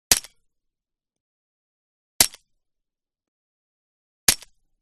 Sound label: GUN Shot Silencer Attached; WEAPONS